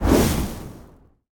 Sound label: fireball; smite; pele